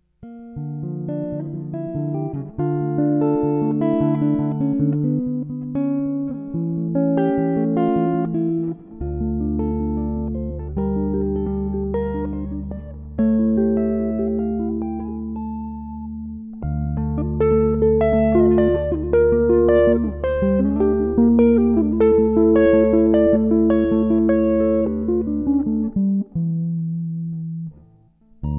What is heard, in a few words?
Guitar; guitar concepts